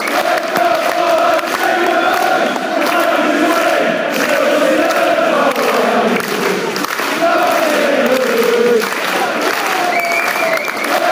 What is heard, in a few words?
singing blues; qpr